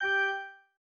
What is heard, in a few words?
organ